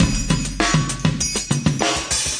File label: 100 big clanger, drum loops and breaks; 091 to 100 bpm